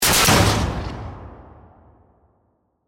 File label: Hit Metallic Distortion; Production Element; Imaging Element; Accent; Transition; Hit Sound Effects; BlastWave